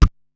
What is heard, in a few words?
"Pehh", Korg Wavestation-SR Drum Kit